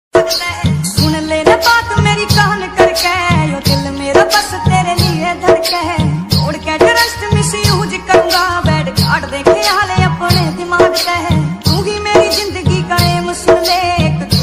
punjabi heart touching ringtone punjabi ringtone love sad ringtone